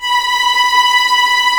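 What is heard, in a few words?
B5 tremolo, strings; East West; The Ultimate String Collection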